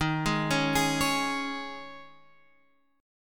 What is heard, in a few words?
C sharp Suspended 2nd, chord